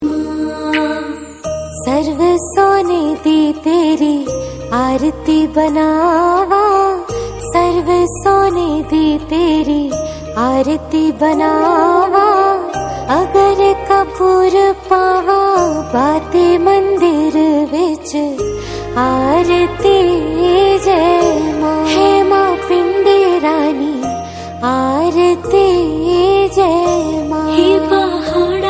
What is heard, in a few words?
Cover Song